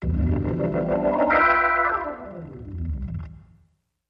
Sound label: Organ Gliss Up and Recede